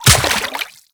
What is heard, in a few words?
Step Water, Footsteps; Warframe; The New War; Characters; Kahl